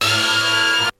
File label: shriek, stabs